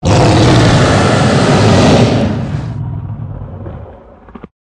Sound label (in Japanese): ドラゴンの鳴き声; 生物